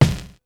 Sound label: KICK, Hip Hop; PlatinumPhattCD1; E-MU Producer Series Vol. 8 – Platinum Phatt